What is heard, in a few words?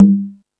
Latin Layers, Drum Machines E-MU Pro-Cussion